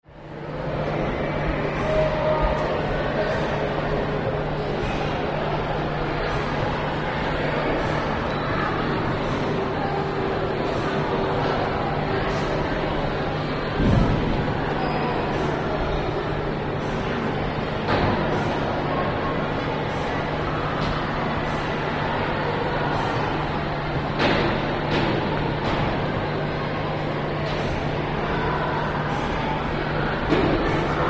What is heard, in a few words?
science museum floor 1